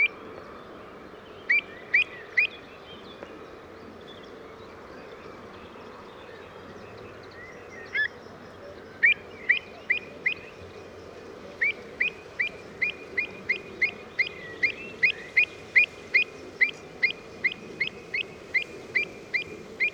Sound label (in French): Avocette elegante Recurvirostra avosetta